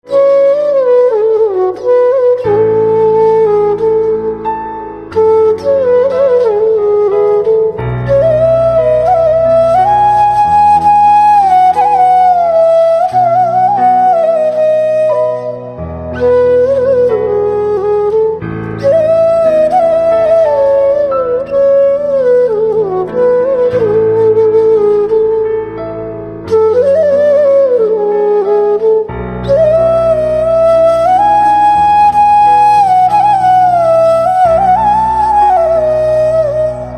Flute Silent, instrumental ringtones

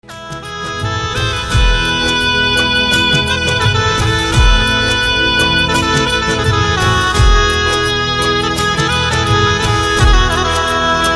Saxophone Instrumental Ringtone

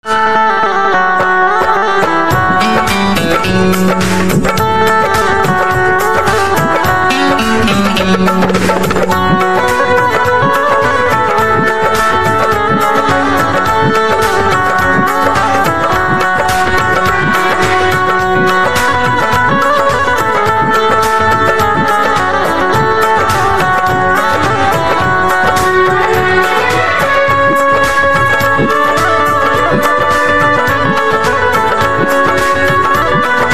Banjo

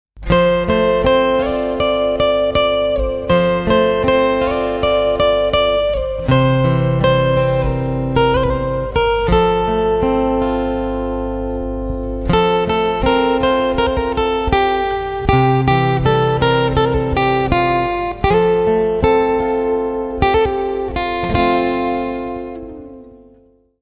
Guitar Slow

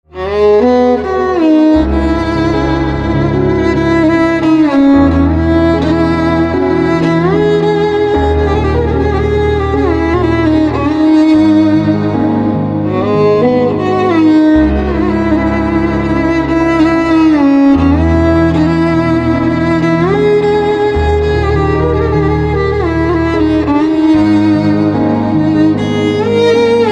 Violin Silent

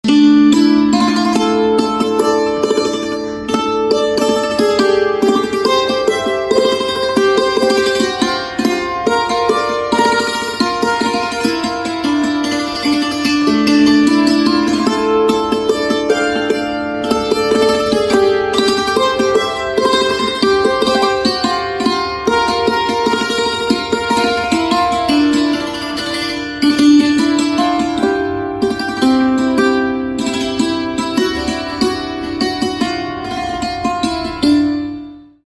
Santoor

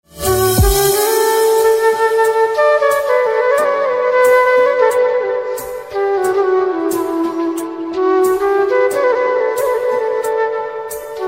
Flute

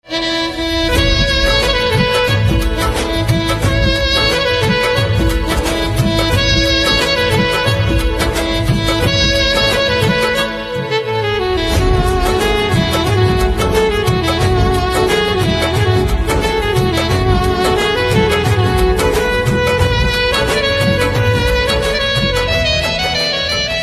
Saxophone, instrumental ringtones

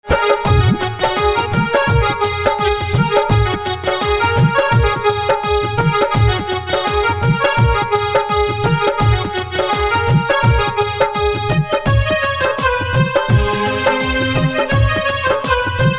Accordion

